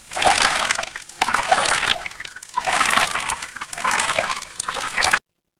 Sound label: water swirl